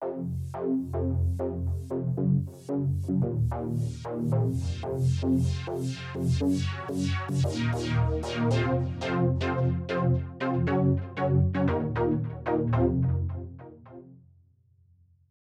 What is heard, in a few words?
bass dual bandpass lowpass 7th; Clavia Nord Wave